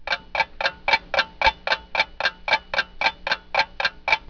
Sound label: Clock Ticking